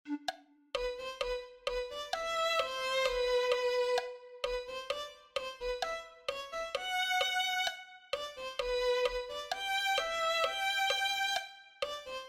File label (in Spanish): se fuerte y valiente violin